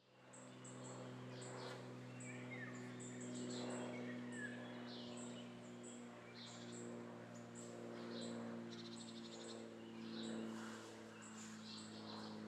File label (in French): Avion Oiseaux Et Herbes, Très Ancienne Carrière